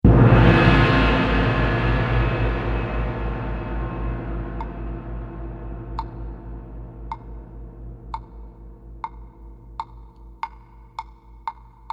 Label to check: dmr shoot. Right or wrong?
Wrong